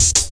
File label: Programmed Stepz Cut, Drum Loops, Jungle, 166.5